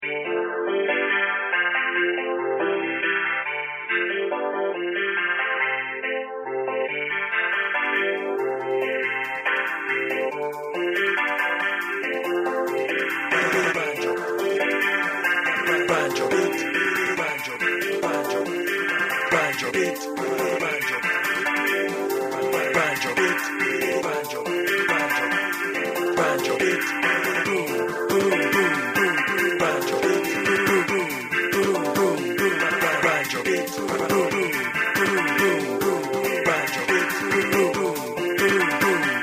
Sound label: banjobeat